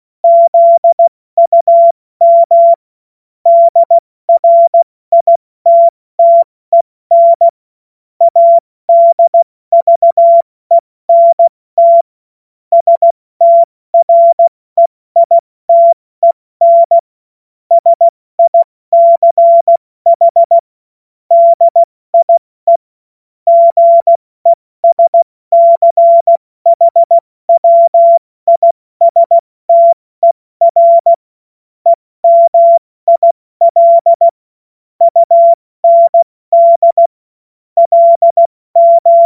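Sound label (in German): Witz, Morsekurs, cw morsen; Witze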